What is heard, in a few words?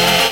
Synth Sound; Vengeance Samples; VEE Synth Shots